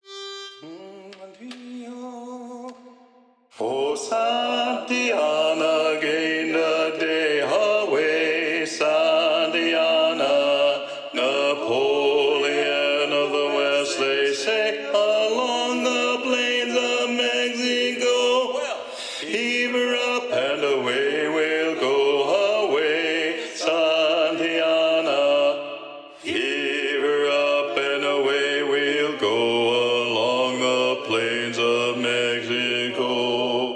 Bass Predominant, sea shanties

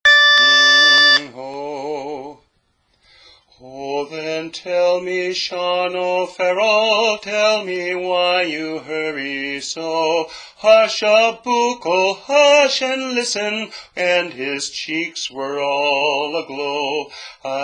Tenor; learning tracks

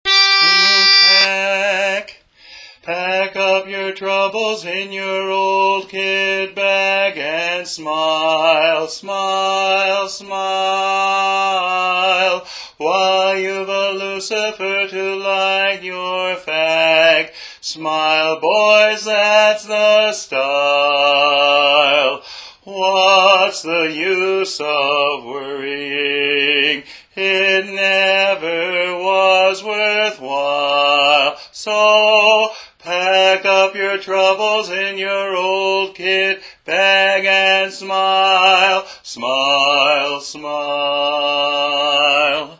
Bass; for god & country music

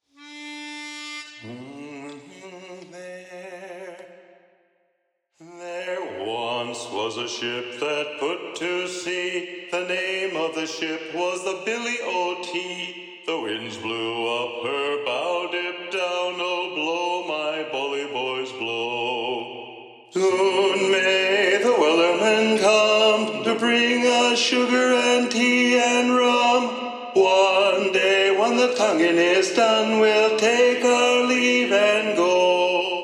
Tenor Predominant; sea shanties; Wellerman

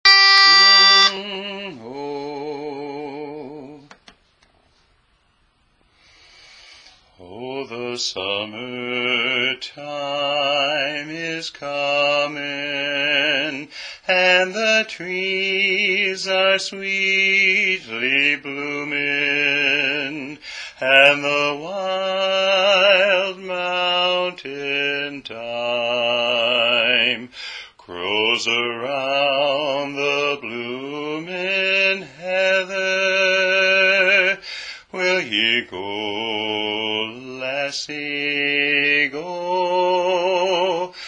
Bass; learning tracks

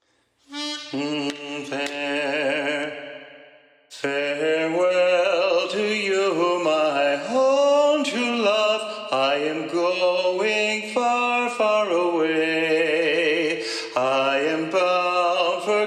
Lead Predominant, sea shanties

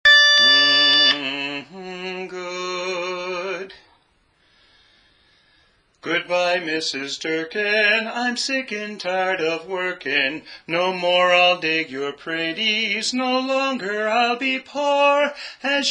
Lead; Goodbye Mrs Durkeen; learning tracks

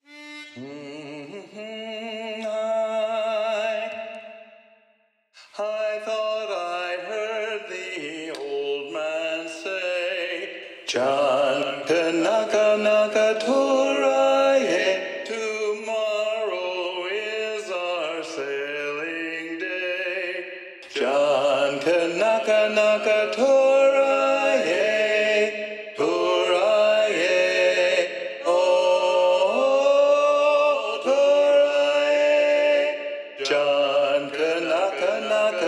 Tenor Predominant; sea shanties; John Kanaka (Don Kanaka)

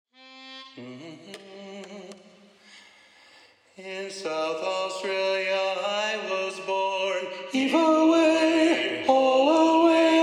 Tenor Predominant; sea shanties; Bound for South Australia

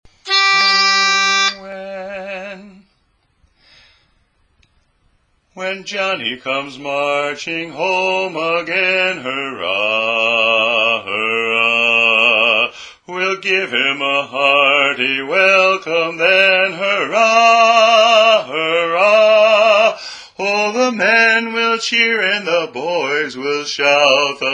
Bass; for god & country music

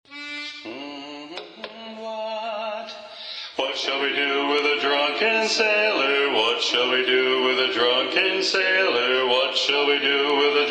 Drunken Sailor (Bass Predominant); sea shanties

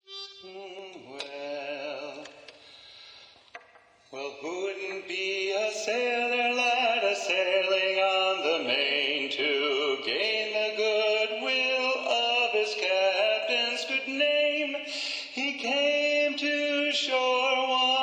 Tenor Predominant, sea shanties

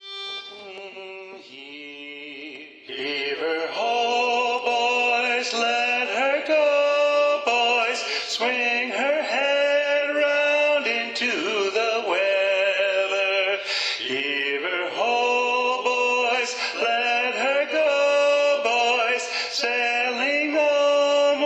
Tenor Predominant, sea shanties; Mingulay Bay

